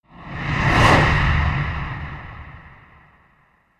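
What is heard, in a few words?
Hit Swoosh Passing Lane; Brand X Music; Brand X Music Catalogue - Editor's Essentials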